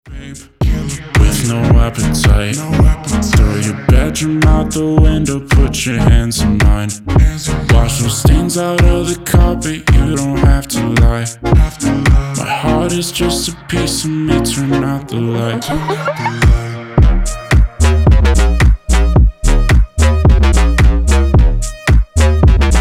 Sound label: POP